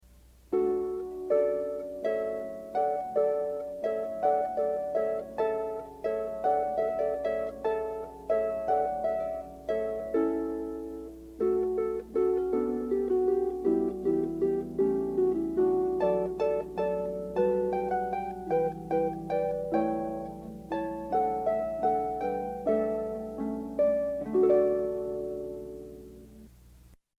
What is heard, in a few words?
hornpipe